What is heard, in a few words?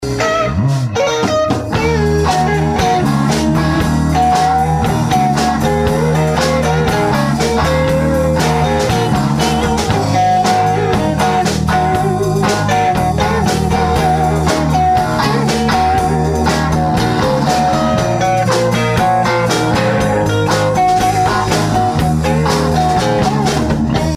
bass